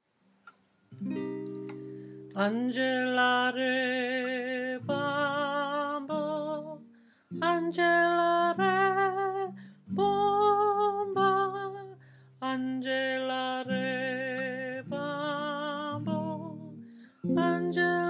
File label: Chorus mens line